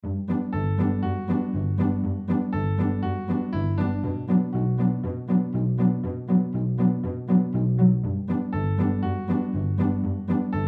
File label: Impish G bm A D orchestral